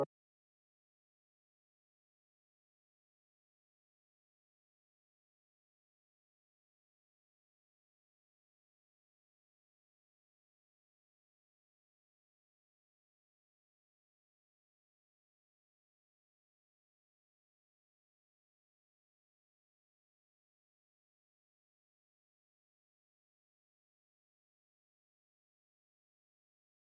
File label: 68 BPM C# Min Piano; Cymatics; Serenity